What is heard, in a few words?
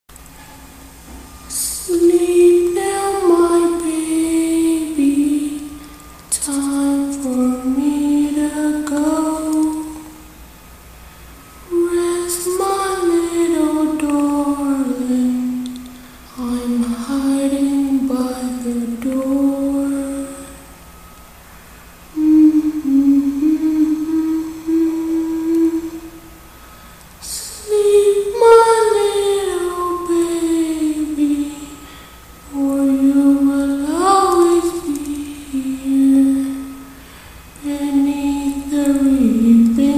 scary lullaby